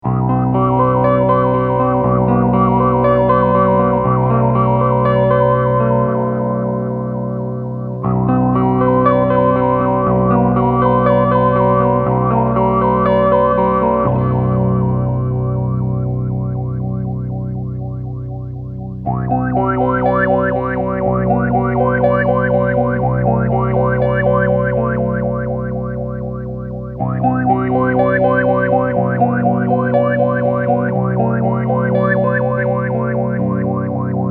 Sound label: filter; Mobius